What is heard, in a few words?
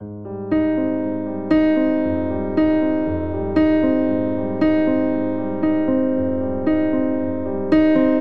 Ostinato Yearning I - vi Ab Major 117 bpm, Grand Piano; MIDI Clips; Ableton